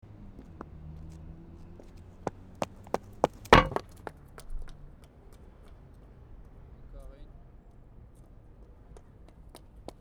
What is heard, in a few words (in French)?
talon femme plaque égout